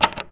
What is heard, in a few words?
ACTIVATE, SWITCHES; AKAI Anime Sound FX